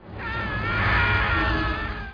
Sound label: exclam; legacy